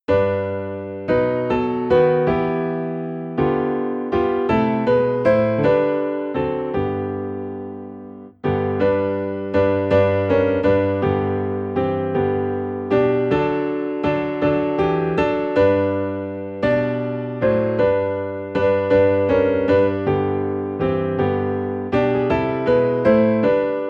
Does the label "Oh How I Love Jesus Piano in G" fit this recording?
Yes